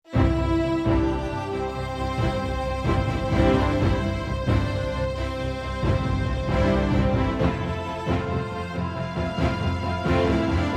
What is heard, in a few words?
horns